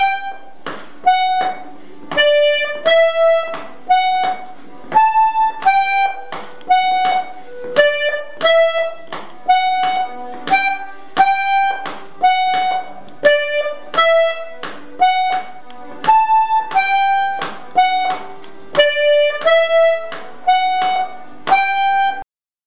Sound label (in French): Hanter dro 2eme voix 2eme partie; Enregistrements St Maurin; rencontres-2014